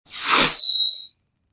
Fireworks launch single tube mortar whistle scream